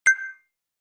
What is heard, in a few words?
joined lobby